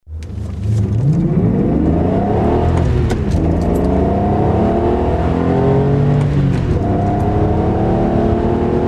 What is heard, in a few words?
850 CSi, bmw